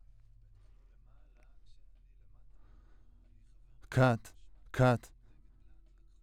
Voc OD

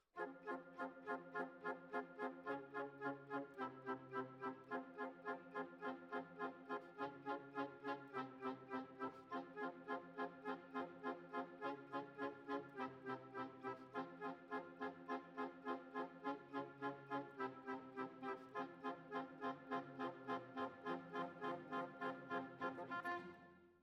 Flute

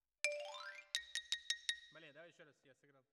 Xylo Close

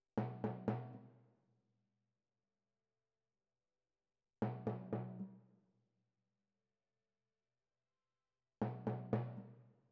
Timp Close

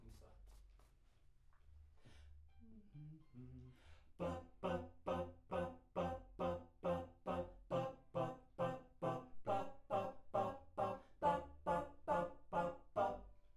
Backs AKG LEFT

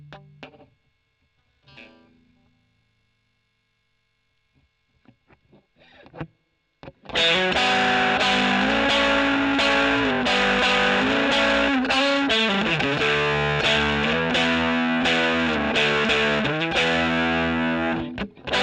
E.GTR OD; Gefell

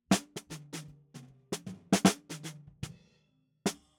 SNR BTM

